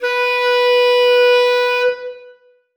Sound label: bass clarinet b4; Sonatina Symphonic Orchestra